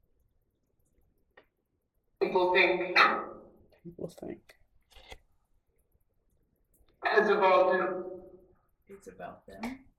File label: Tarot Card Room Phasma Box